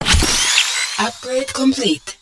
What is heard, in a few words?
upgrade complete; garrysmod